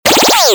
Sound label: Laser